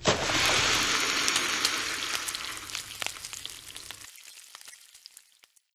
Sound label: deep fried